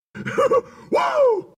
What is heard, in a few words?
cheer; gears of war; carmine